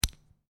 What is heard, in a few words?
Lego Pull Apart Two Lego Pieces; Miscellaneous Toy Sound Effects